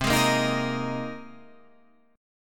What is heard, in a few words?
C Minor 7th Sharp 5th, Guitar, Standard; strummed; 8,11,8,8,11,11; capo=2